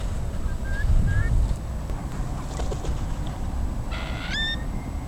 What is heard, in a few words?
Numenius madagascariensis juv